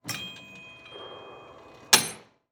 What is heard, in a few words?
Weight Machine Lift; Sports